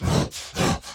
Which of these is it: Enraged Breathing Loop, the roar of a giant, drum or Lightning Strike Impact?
Enraged Breathing Loop